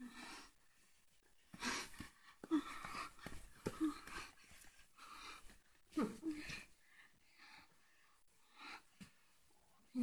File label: click